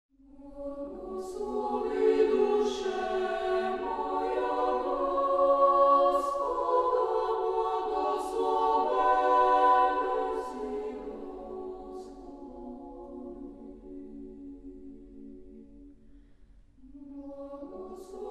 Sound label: Blagoslovi Dushe Moya, Boys' Choir; Orthodox Music; Благослови душе моя, Флигменко; Sofia Boys' Choir